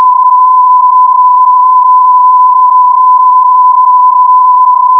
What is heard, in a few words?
QPSK31, modes